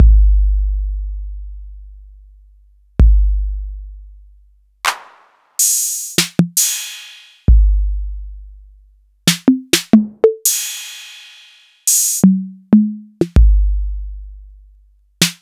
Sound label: TR-808; Sliced